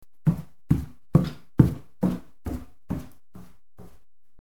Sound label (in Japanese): ashioto